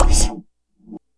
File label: WASH HIT; E-MU Formula 4000 Series Vol. 2